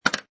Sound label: Pinball Sound Clank With Recoil; Video Games (Sound Effects:Games)